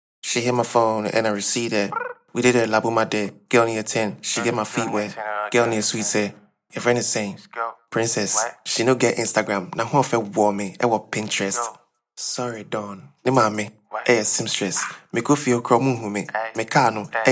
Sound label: Acapella